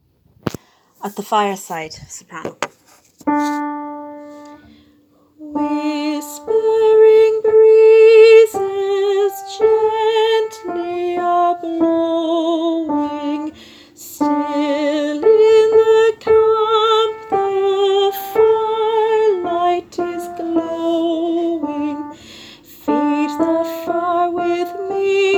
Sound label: soprano